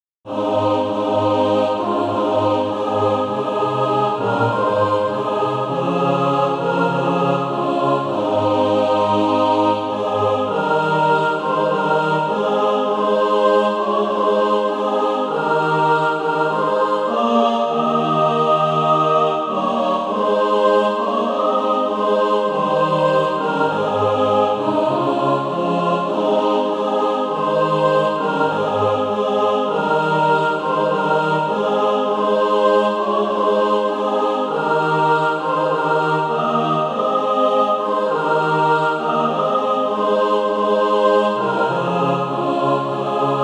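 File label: mixed, SATB, Practise Music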